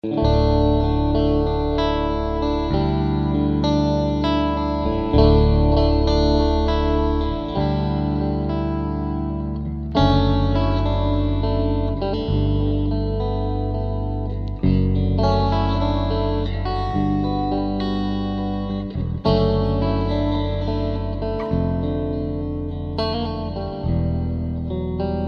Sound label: Strat